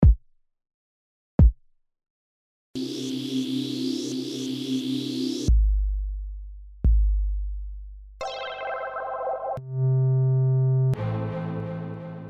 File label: Drum Kit Sampler